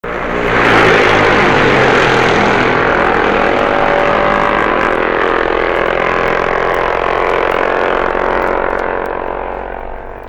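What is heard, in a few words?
Norton Singles, motorcycle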